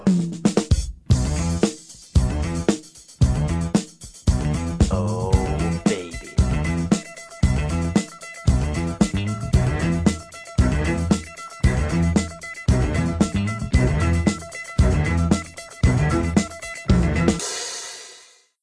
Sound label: podcast theme